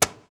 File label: CAJON; Univers Sons - Raricussions